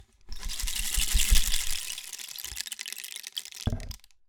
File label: Rain Stick, Percussion Samples